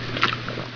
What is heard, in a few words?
suit charge; svencoop